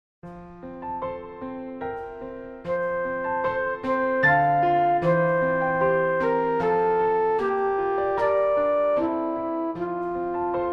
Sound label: Piano Flute; Max Reger; Mit Rosen Bestreut